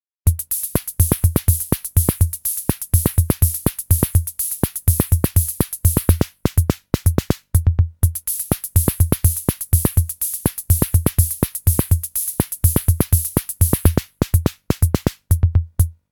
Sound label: SR-88 PATTERN